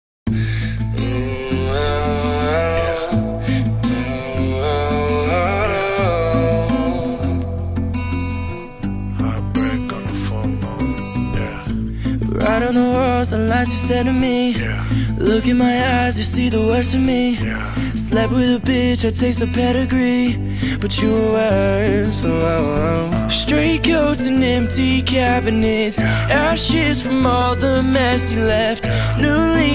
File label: Acoustic Version